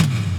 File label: TOM